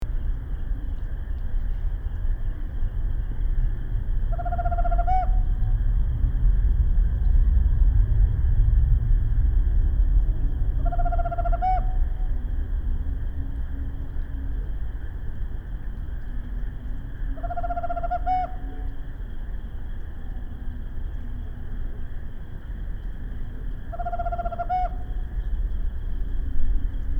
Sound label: Tropical Screech Owl Megascops choliba